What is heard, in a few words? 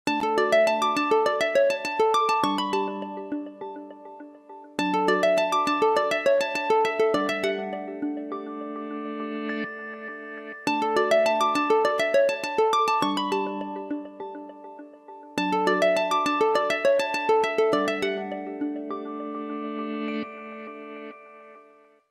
lg g series g4 g4s g4 stylus g stylo v10